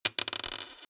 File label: dollar coin, clattering